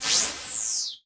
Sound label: Multimedia Internet CD-Rom Flash Whoosh Electronic